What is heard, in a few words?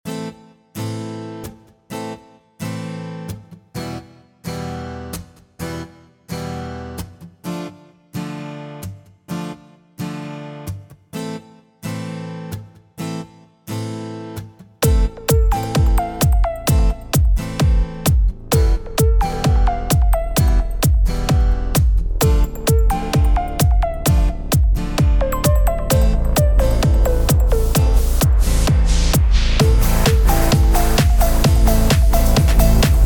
Disco Track